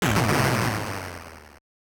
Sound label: Large enemy destroyed; Gradius; MSX SCC